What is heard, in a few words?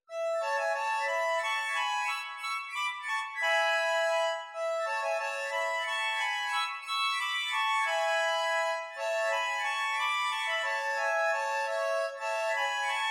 valse